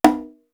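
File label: dynamic B, drum kit; East West; Global Sounds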